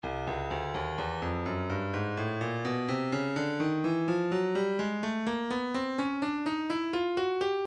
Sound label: breakbeat midi files 125 129 bpm 126 disco; Cardboard Disco D; Stylus Breakbeat Midi Files